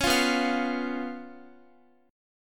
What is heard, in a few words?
B Suspended 2nd Flat 5th, Guitar; Bsus2b5; strummed; x,x,13,10,11,13